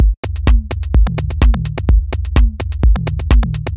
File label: Lovebeat 127bpm, House Drumloops; 90 s Sample CDs; Zero-G House Fabrik Drumloops; ZG Drumloops